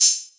Hat, Hi Hats; Zero-G Sound Sense Grime Scene; GS Kits

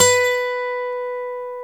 A GT B3; AKAI S-Series CD-ROM Sound Library VOL-7; A GUITAR 1

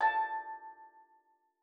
A4, STEELDRUMS; Xsample VOL-4

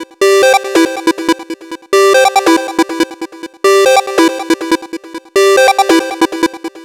NRG Lead, trance

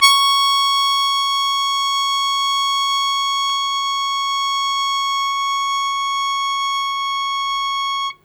Long Sustain Ta C#3, Harmonica; Zero G - Harmonica

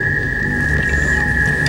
FX, Futurist Drum & Bass

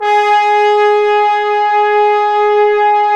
BRS TBNS, LOW BRASS; Roland - LA Composer vol. 1

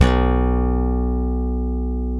E Bass Slap

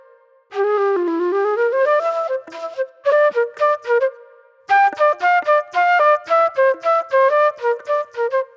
Raw Funk Flute

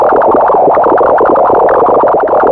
BURBLES; Zero-G Ambient 1

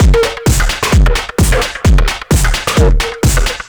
Beat Drive 130, distorted drum loops 130bpm; musicradar

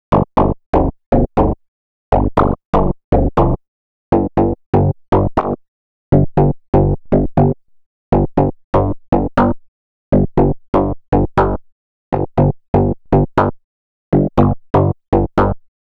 Bass, EDM

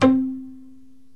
PITZ PIANO

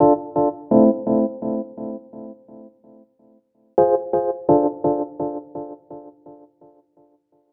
D&B Keys Riff; neuro

